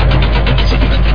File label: tank; skrap